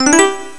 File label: win